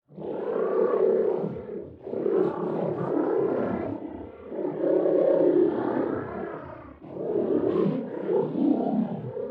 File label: roar; moon; lin gang